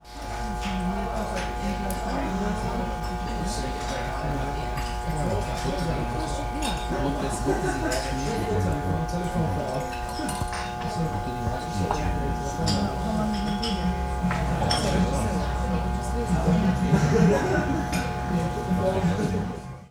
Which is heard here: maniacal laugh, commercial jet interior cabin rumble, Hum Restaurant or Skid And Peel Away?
Hum Restaurant